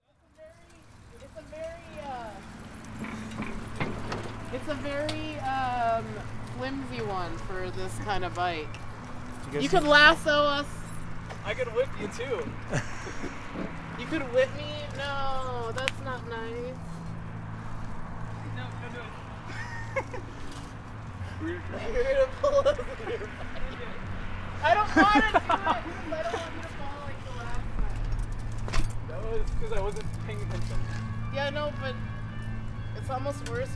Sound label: kids